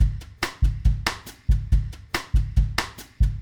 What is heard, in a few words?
Drums, Jazz